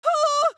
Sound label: AHurt; Morty